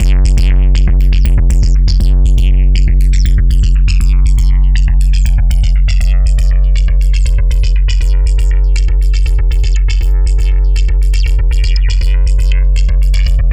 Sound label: Processed Perc